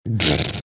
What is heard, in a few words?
snorting